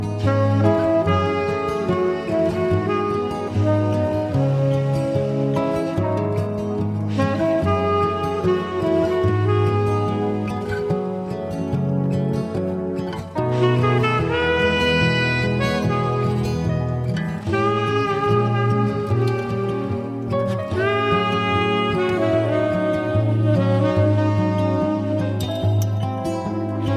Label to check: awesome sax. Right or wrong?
Right